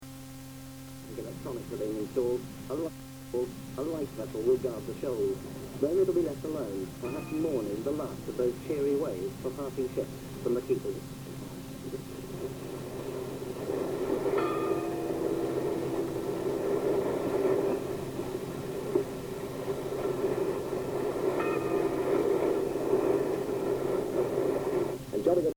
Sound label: tower bell; nab